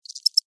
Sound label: Cricket Chirp, Single, Insect; Animal; Bug; Crickets